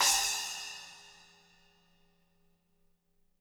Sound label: SPLSH, SPLASHES